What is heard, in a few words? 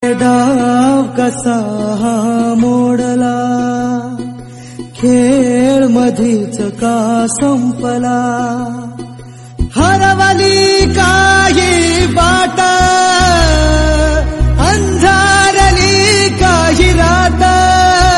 devotional, marathi